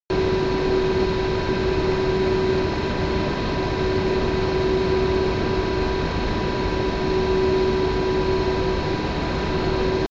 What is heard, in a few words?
train intercity train locomotive idle